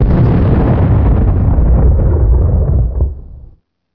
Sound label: interior explosion distant